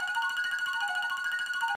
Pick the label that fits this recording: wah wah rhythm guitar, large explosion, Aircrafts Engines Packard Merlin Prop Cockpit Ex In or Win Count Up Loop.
Win Count Up Loop